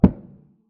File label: Giant Step